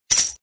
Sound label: Coin Pay; UI; unity